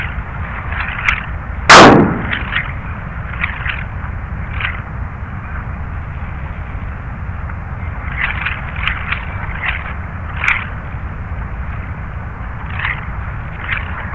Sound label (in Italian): Colfiorito, Sonificazioni Eventi Sismici